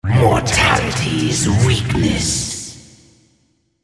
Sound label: attack; Malzahar